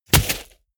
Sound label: body fall sand hard; krieg-waffen; nahkampf; soundpool